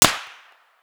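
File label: supersonic snap, bullet snap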